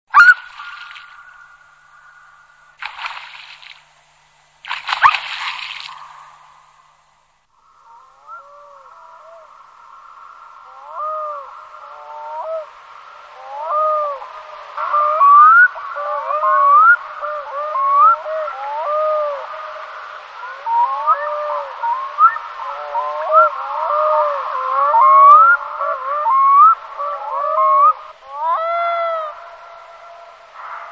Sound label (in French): plongeon arctique, oiseaux chant